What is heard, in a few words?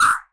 Elektron Monomachine, Drum Machines